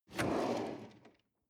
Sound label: drawer medium open draw short